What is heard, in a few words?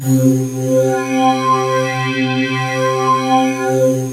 PROPHET VS, OLD SYNTHS; Rubber Chicken Software Sample Bank; SCI PROPHET